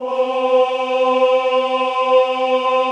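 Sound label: choir C3, men; Sound & Vision - Gigapack I CD 2 (Roland); Men-Combi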